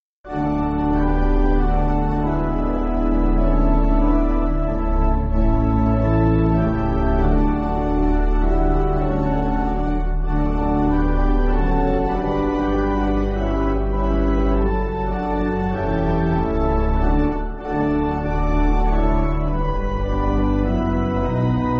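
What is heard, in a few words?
Spiritual Pipe, Pipe Organ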